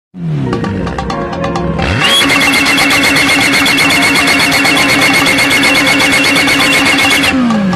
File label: Motor